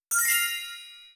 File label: victory key; Replay VHS is not dead